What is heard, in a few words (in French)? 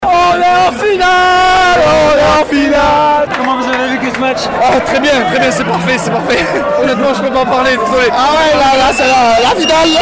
FRANCE BELGIQUE AMBIANCE